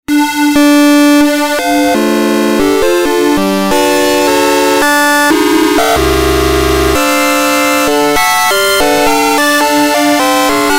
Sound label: Himalia Multi OSC sweep tune; Io Instruments